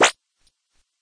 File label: laser; super minipix; android